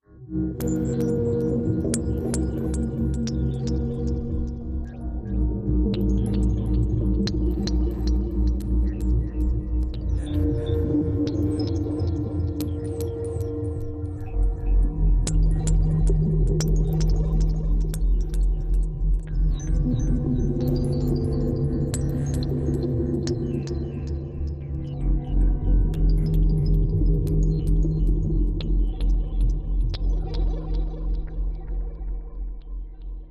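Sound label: Dripping Cave Wet Electric, eerie ambience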